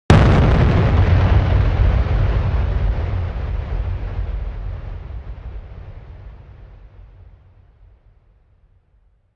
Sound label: nuclear explosion; SFX; Nuclear Explosion 2; DailySounds